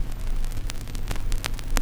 Vinyl Crackle

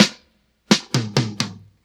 Tom Roll n Break; Drum Digger

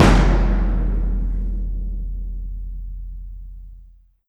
Perc Hit Archestra

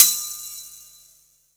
Bell Celtic Bell

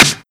Snare Trap; pitchglitch

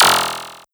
Hi Tech Drum Cymbal

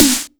Snare Dbstep; pitchglitch

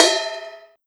Ride Bell; Lewis

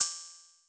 HiHat Open; Var Breaks

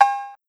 Bell Cowbell

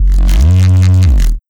Sound FX Reese Acoustic

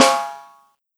Snare Soft; Lightning1024

